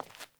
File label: Sandals Walk Default, Footsteps